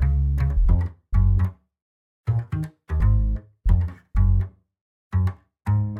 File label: music main game bass; reef raider